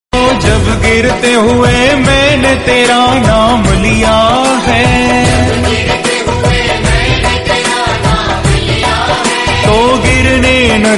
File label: bhakti hindi